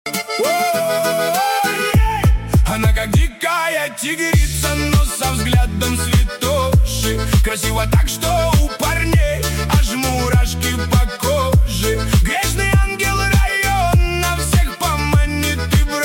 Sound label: light music